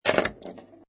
Pinball Sound Ball Rattling Objects; Video Games; Lynne Music

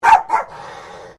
Animal Dog Chihuahua Bark Double Snarl; Newcastle; Various domestic dogs; Dog Sound Effects; Dogs (Sound Effects:Animals); Foundation1

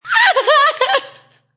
Female Scared Sound, Female horror sounds; Breathing; Human

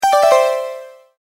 multimedia tone alert

